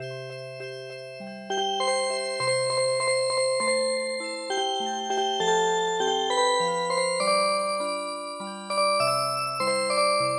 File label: Music Box, traditional; The Darby Ram